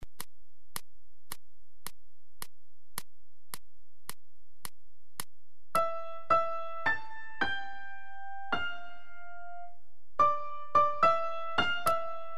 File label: Tango 108; Uebungs-CDs; Playbacks; Seiber